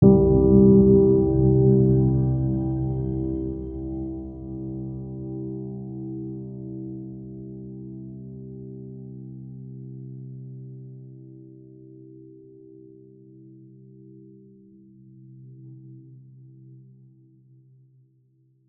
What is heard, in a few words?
piano